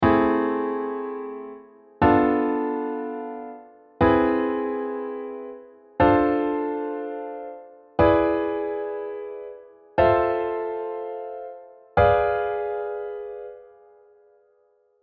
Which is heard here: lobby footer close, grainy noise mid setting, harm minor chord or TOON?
harm minor chord